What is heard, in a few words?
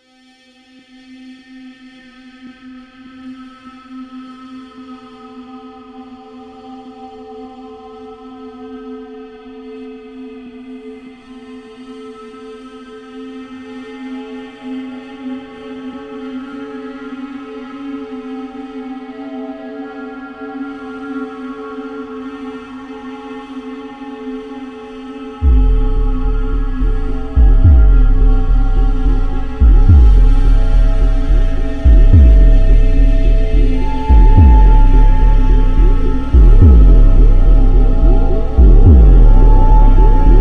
Harmonicadness